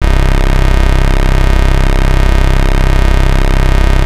Anasound Long lo C, Industrial Analog Single Notes; Sonic Foundry (Sony Creative Software)